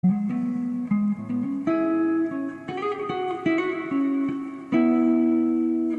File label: guitar tone